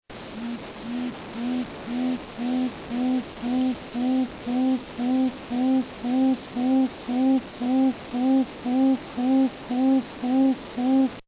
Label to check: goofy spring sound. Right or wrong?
Wrong